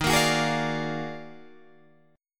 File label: Eb Minor Major 11th, Guitar, Drop D